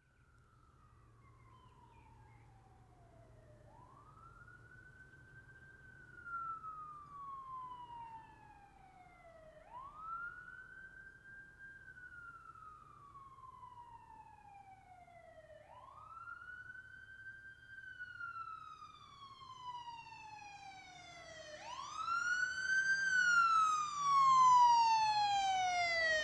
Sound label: Police Car Arrives With Wail Sire; Emergency; BBC Sound Effects Library